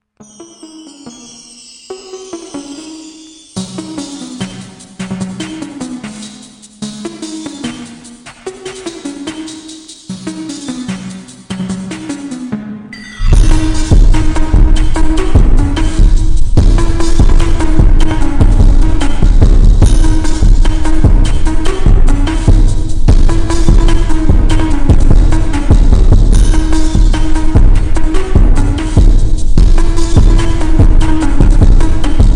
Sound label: Phonk Drift New Year slowed Reverb Music Remix